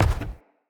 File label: Step Wood, Footsteps; Enemy; Characters; Corrupted Sproutfolk